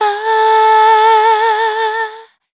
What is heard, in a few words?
FEMALE, Dance Vocals